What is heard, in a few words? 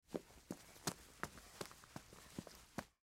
Footsteps Toddler Walk Fast Clothes Movement Heavy Breathing; Foley; Blastwave